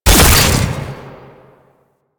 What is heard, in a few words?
Alt Shot; Weapons; Warframe; Fulmin Prime